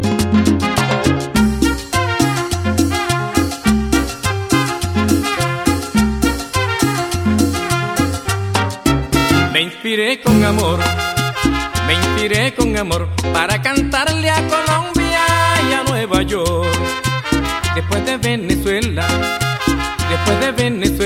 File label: Cumbia